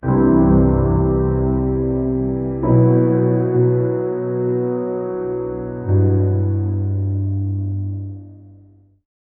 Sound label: minimal piano or deep cel; instrument